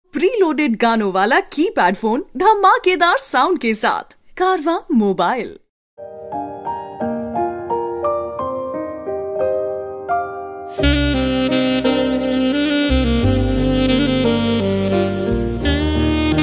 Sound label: Instrumental